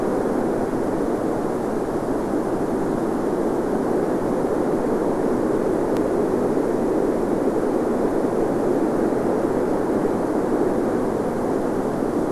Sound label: WFR audio emfisis